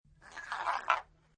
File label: CPU Voice; Alien cyborg language pharse with slight flange effect